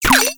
item picked charm

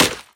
wood bullet hit

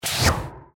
weapon bow quick shot

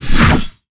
weapon dagger shadow step back

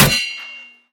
pan bullet hit

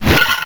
player weapon pickup magic

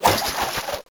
weapon bow trap triggered

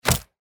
obstacle hit wood

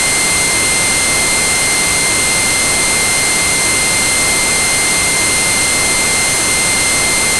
player blinded loop

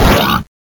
monster orc hurt

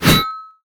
weapon axe cleave

